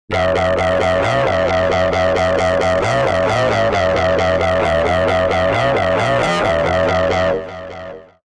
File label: Synth Mono